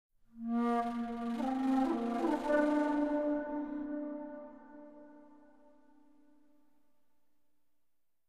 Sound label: pipe; Myst III Exile